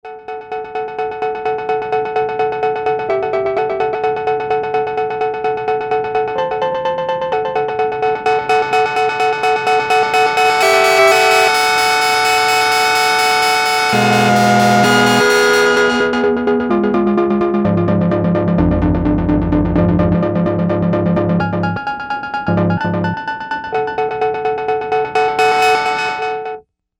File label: LFO Saw